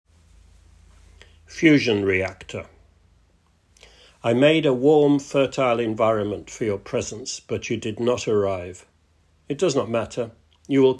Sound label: Fusion Reactor